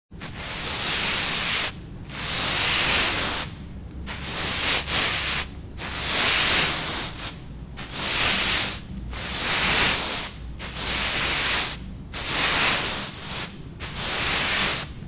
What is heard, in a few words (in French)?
balayage